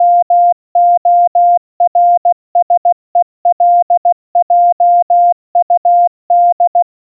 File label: morse melody